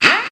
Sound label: fx Drill; Akai MPC5000